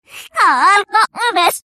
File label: clock, reverse